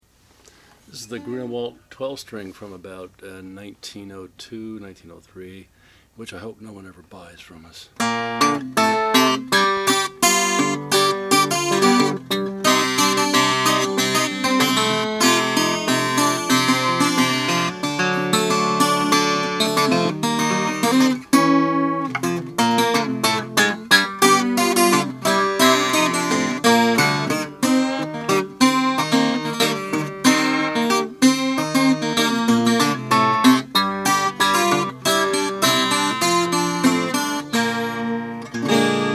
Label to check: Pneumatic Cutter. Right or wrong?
Wrong